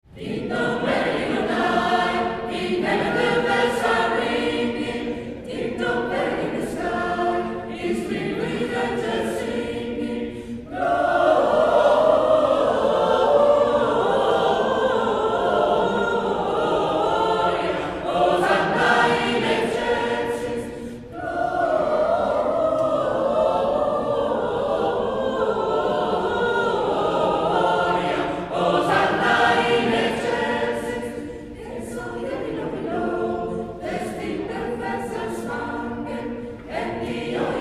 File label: Ding dong merrily on high; CantareXNatale 2008